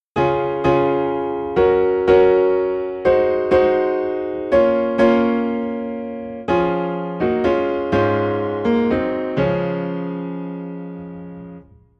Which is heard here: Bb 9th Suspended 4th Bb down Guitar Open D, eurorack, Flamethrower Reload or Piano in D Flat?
Piano in D Flat